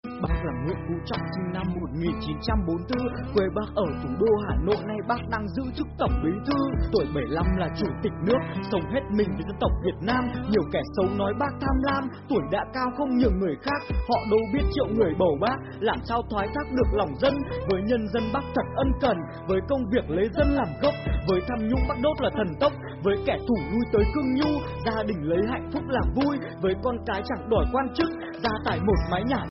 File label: Rap